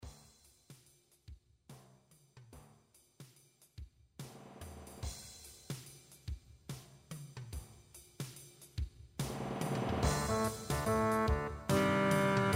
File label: Noot Noot Concert Band